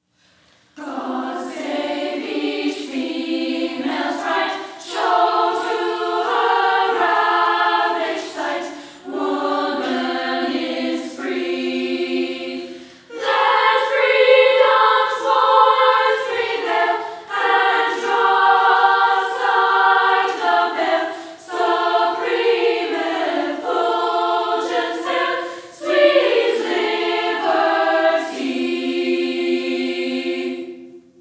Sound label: Childrens Choir